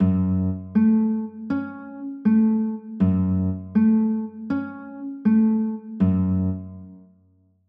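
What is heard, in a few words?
G flat m9 chord, guitar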